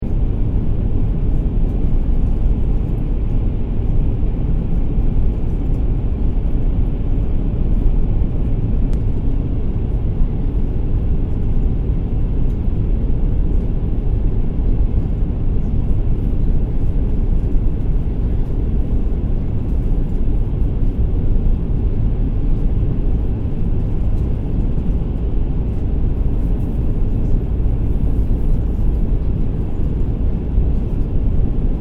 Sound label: Train Shinkansen N700 Japan Interior Ambience Loop; Jr; Japanese Trains; Vehicles